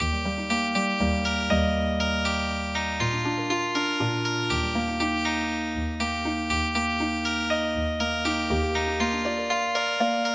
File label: Healing Harp